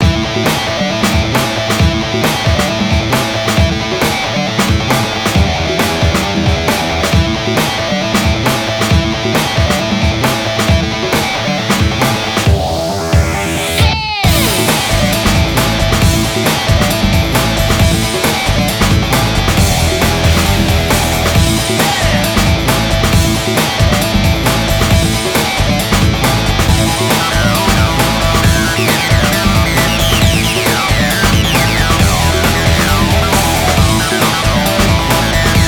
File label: Race Lose; Racing; lego universe macos windows gamerip 2010